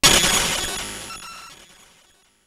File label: orbit; Back In Time Records; Big Bang; SFX